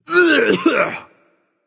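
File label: nonverbal clear throat; smite; voicepack; merlin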